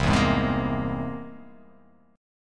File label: C Augmented 7th; strummed; JGuitar